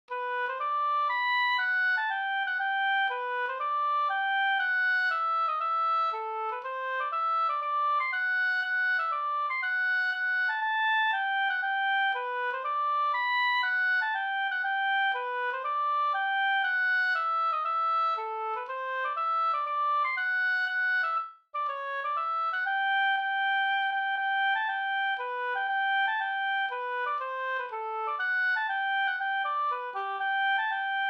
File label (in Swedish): mazurka; e Lovisa Olsson Asarna Jm